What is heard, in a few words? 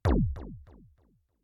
sound end; idlebreakout